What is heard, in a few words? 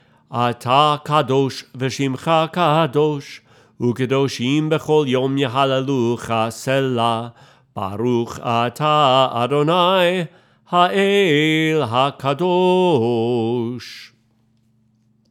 Sung; Ata Kadosh